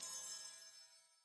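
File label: video button click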